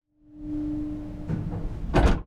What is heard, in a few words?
elevator stop, hydraulic